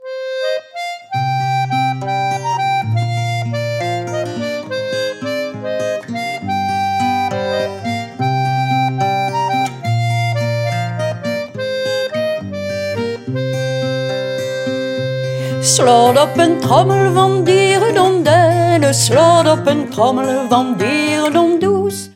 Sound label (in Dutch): slaete om den trommel